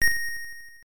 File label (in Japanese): 硬いブロック反射; Arkanoid; PC-88